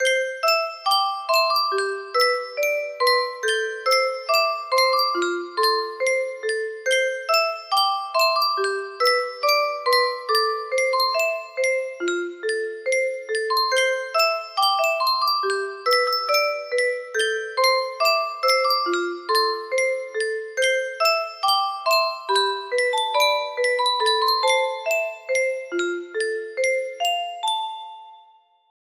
music box theme, music box melody

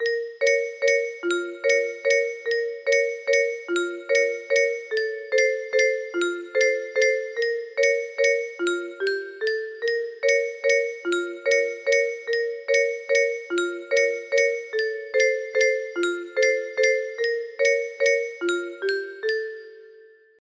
clown music, music box melody

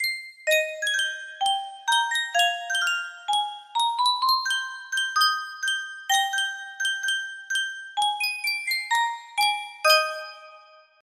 Sankyo Miniature Music Box, music box melody

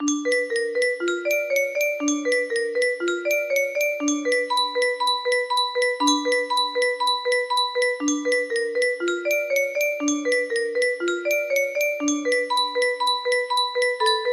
f scale, music box melody

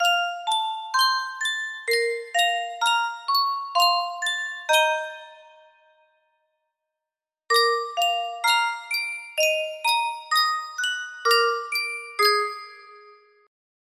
Sankyo Custom Tune Music Box, Unknown Tune; final fantasy

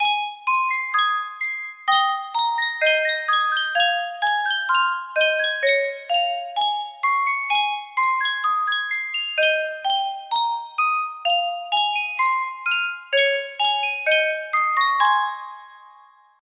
yunsheng custom tune music box

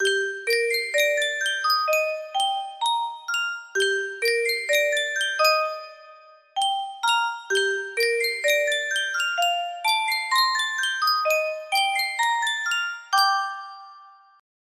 sankyo custom tune music box, music box melody

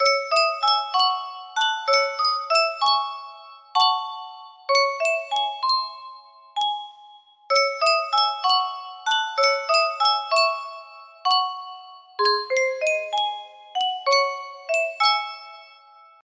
music box

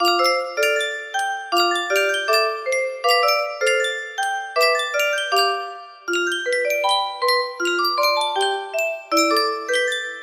music box, music box melody; old bear

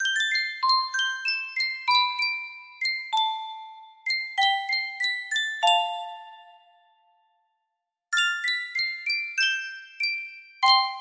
sankyo custom tune music box

